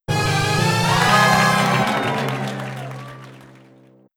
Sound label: win